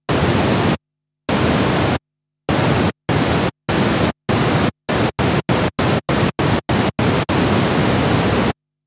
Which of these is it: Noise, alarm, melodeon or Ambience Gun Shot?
Noise